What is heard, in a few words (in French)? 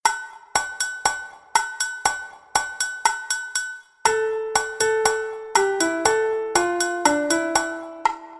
rythmique